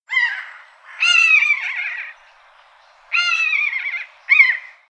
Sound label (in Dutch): vogel